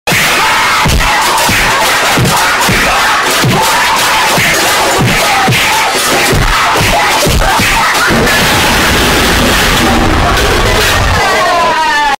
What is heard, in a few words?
aggressive fight and beats sound